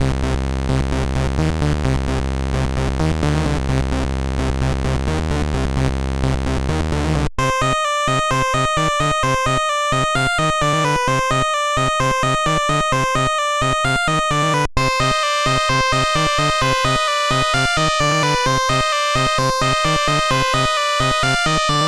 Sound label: march